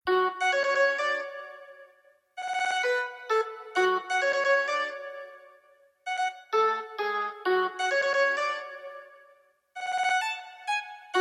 Instrumental

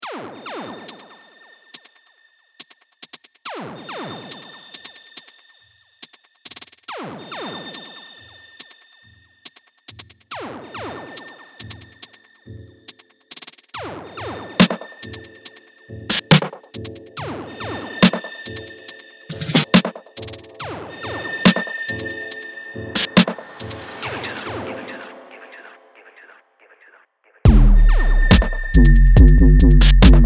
Shakuhachi

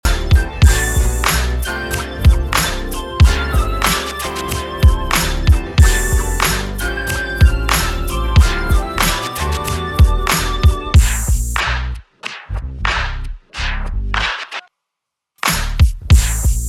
Birthday instrumental

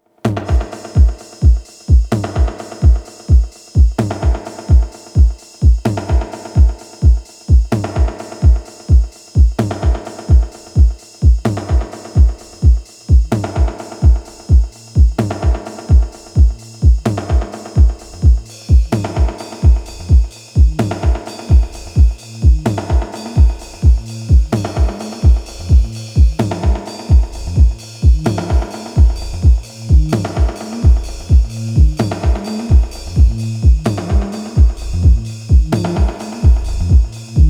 rhodes

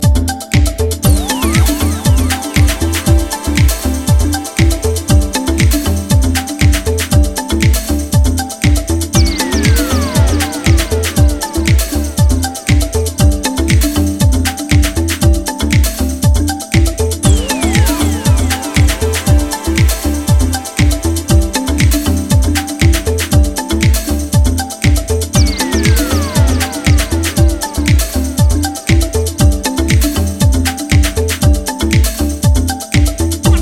Kalimba

